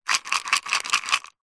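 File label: household vitamin tablets in plastic pot lid off shaking fast; zapsplat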